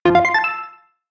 Chime, notifications; Coolpad; Note-8

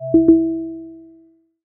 normal lowbattery; Oppo; A54s; ui

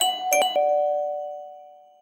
Doorbell; SP6

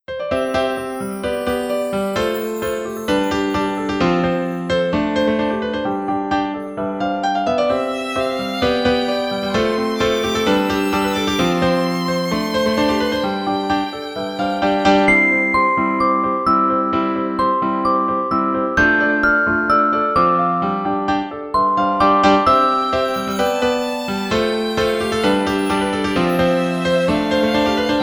Clock Sweet, alarms; Oppo; Real R801

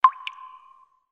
Water Drops, notifications; Infinix; XOS 10

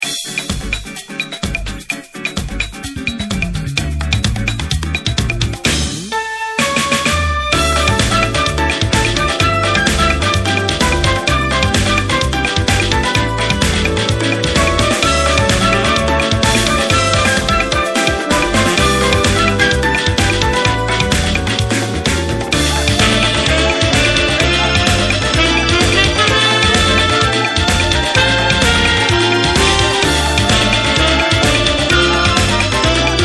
Latin Party

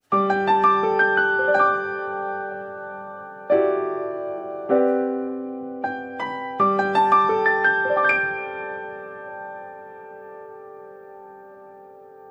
Piano, alarms; BQ Russia 5007L Iron

